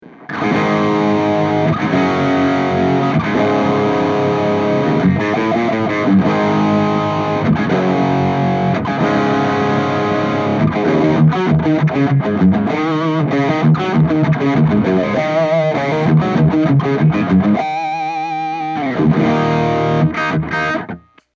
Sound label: Pulsonic